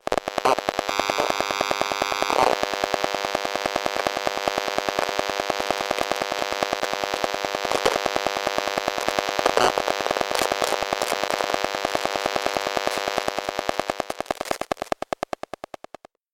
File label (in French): Maison Combinaison de Wi Fi dun telephone DECT et dun telephone portable